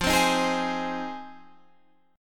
Ab 6th F

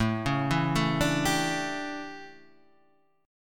D Major 7th Suspended 2nd Suspended 4th, chord

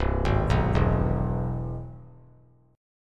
C Augmented Major 7th; arpeggiated; JGuitar

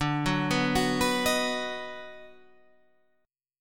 D sharp Minor Major 7th Sharp 5th

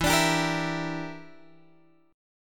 E Minor 7th Flat 5th E, Guitar Open F; Em7b5; strummed; x,11,14,14,13,11

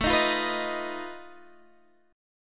B 7th Flat 5th A, guitar chord

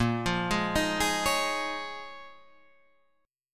D sharp 7th Suspended 2nd Suspended 4th A sharp

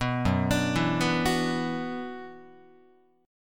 F sharp 7th Suspended 4th Sharp 5th, Guitar; arpeggiated; F#7sus4#5; 2,2,5,2,3,5; 4A,4E,5A,5D,5G#,6C#

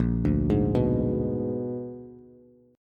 Bb 7th Flat 9th Bb, Bass; Bb7b9; arpeggiated; JGuitar

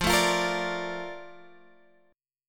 Bb Major 7th, F

